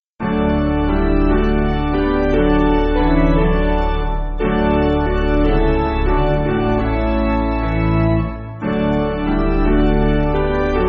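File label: Piano, Backing Files for Churches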